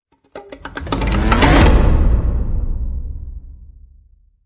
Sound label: String Plucks Hit